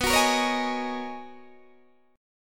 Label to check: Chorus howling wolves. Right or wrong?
Wrong